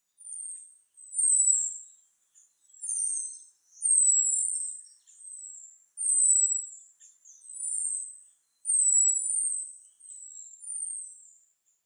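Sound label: Japanese Thrush; kurotsugumi; nasushiobara; koe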